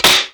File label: back whack; E-MU Formula 4000 Series Vol. 2